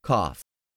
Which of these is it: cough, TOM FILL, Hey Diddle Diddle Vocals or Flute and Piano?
cough